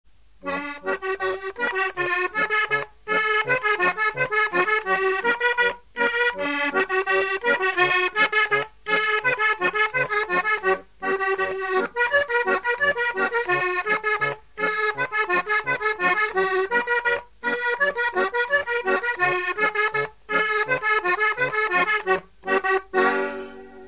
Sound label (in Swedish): polka, flackekurs